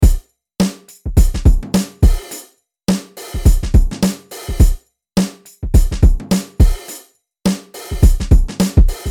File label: Neo Soul 105BPM